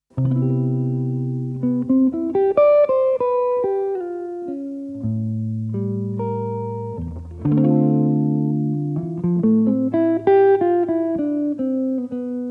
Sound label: Jazz guitar